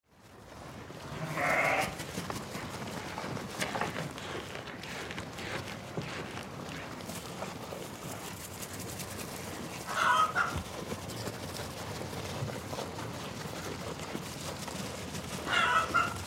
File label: Sheep Baa Eat Food Chicken Caw; Chickens; Animal; Blastwave